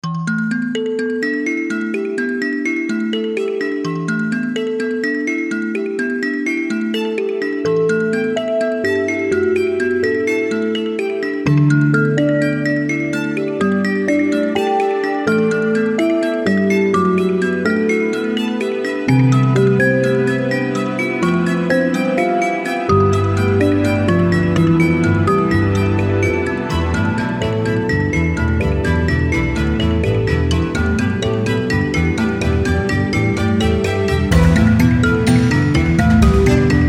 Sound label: kalimba; gimmelgibbs